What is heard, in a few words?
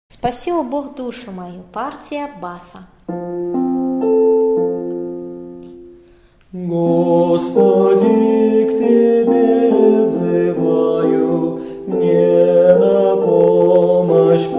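bass; Gospodi k Tebe vzyvaju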